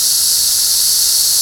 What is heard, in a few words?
S-Sound; Organics